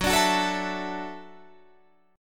F sharp Minor 7th Sharp 5th F sharp, Guitar Standard; strummed; JGuitar